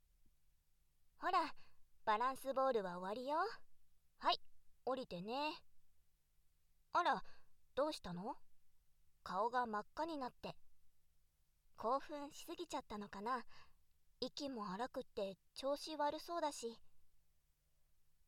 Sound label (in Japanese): 左右の耳舐め; 長椅子に横になってもらい; SEなし